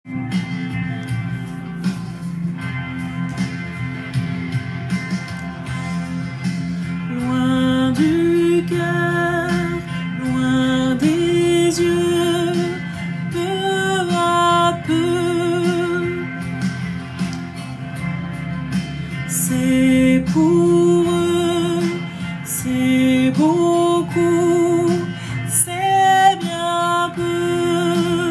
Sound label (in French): Ethiopie instrumental voix; chorale